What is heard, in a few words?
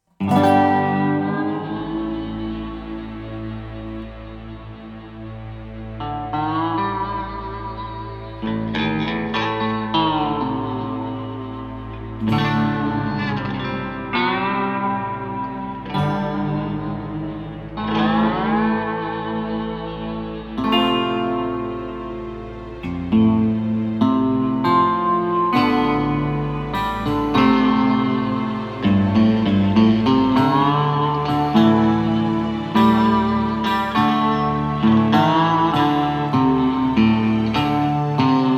Music Ambient Tension No Dobro No Piano; Mission Music; Starcraft 2 Wings of Liberty